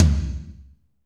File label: tom, Kit Dry; Northstar - Drumscapes Roland